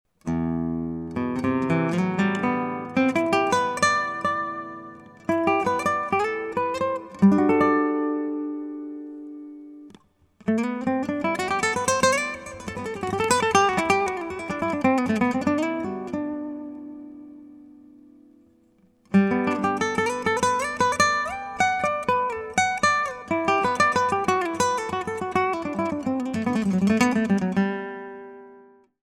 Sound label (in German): konzertgitarre easypick single ohne EQ stereo